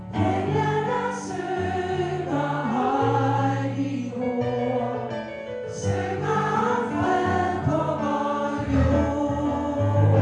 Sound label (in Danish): en stjerne skinner alt